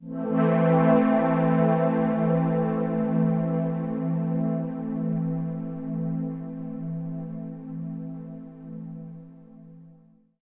PAD R; Best Service Advanced Media Trax Building Blocks; SIERRA

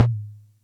Percussion Computer Tom; Atlantex MPC-1; Atlantex Music Percussion Computer

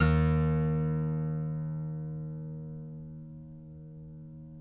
D#, guitars; Spectrasonics; Hans Zimmer Guitars VOL-2; FNGSTYLE PFM

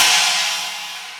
Chinese, Roland R-8

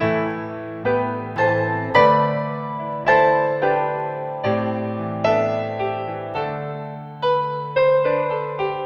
Piano; Sonic Foundry (Sony Creative Software); Ugly Remnants VOL-2

